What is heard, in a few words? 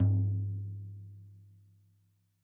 tom lo, gretsch kit